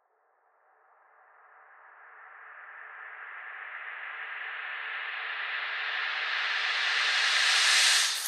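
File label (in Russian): вжух усиливающийся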